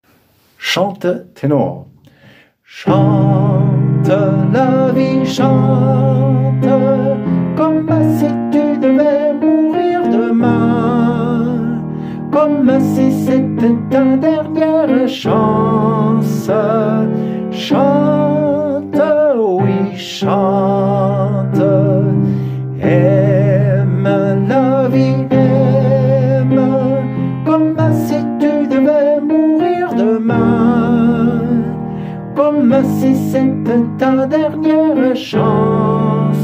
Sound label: Tenor